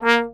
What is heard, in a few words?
TRO STAC A#2, Trombone Staccato; Orchestral; DirectWave; Varazdin Orchestral